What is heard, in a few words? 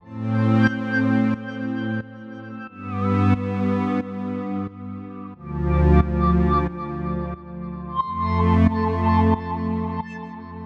90 A Pad Dark